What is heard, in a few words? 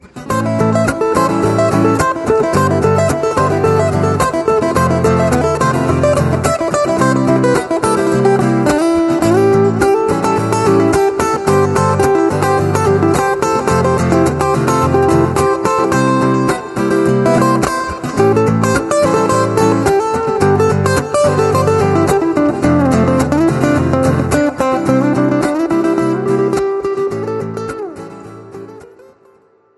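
instrumental, Acoustic Guitar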